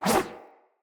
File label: Sword Whoosh; Weapons; Swords; Garren Sword